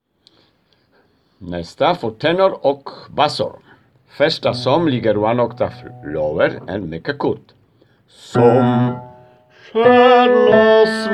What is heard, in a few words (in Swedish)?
tenor o bas